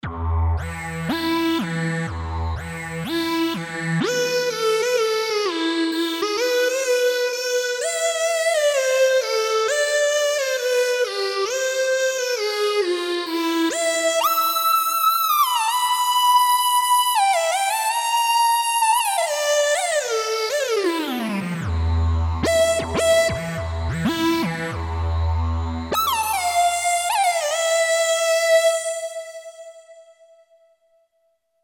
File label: System-100M Lead, Roland JD-990; Vintage Board